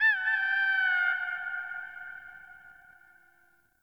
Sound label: Birds High, Ambience Plains Landscape; Warframe; Eidolon Plains